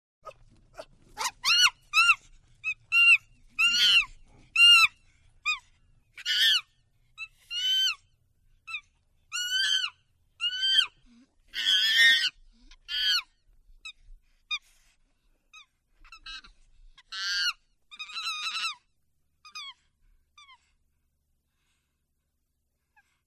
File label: CHIMP SCREAM TYPICAL CHIMP SCREAM; wildlife sounds